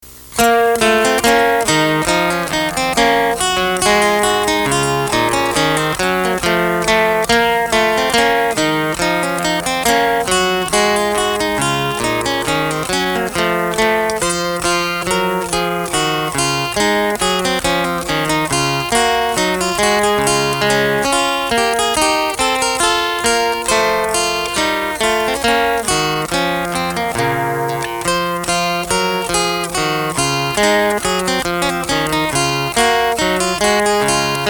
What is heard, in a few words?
Gavotte